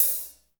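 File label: hi hat; Best Service Gigapack 2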